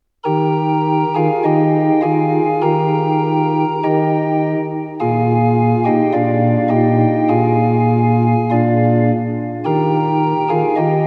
Kyrie Es-Dur 3x

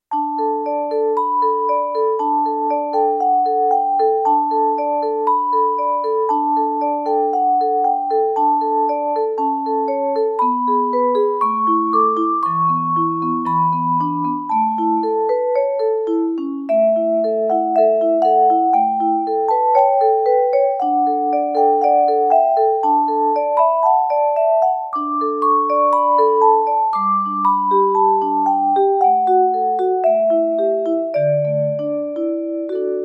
O du fröhliche

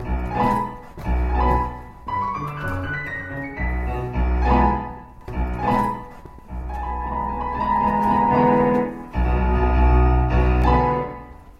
Bergkönig schluss

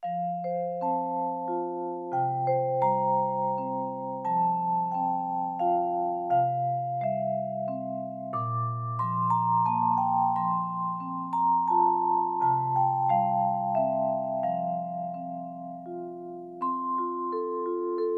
Dona nobis Pacem F-Dur

